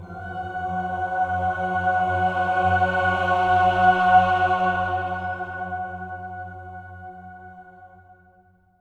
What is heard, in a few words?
OCT C#4, SWELL OCTAVE, Voices